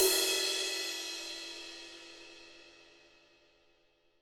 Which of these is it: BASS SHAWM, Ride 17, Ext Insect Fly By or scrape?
Ride 17